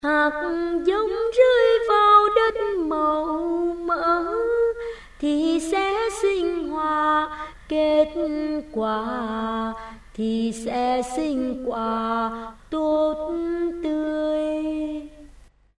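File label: soprano tenor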